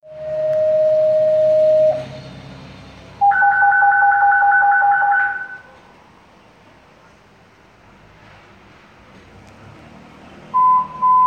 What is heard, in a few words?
Dispatch Tone